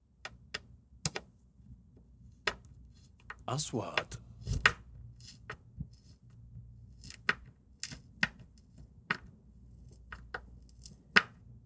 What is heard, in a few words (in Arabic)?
شد سكروب; sound effect